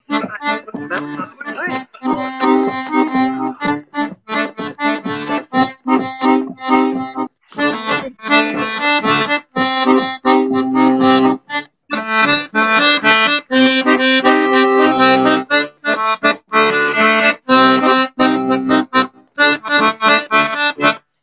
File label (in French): Rythmique Tawes; Bourrées2Temps